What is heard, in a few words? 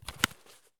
plastic use; Escape from Tarkov